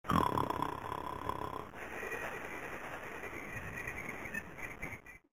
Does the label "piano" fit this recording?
No